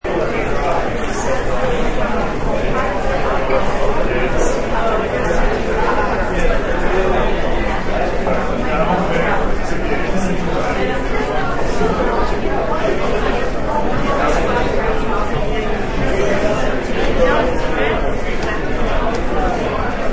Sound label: Many college students walk and talk in student center.; Classroom; Schools